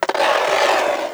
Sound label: board scrape long, skater; Tony Hawk's Project 8; XBOX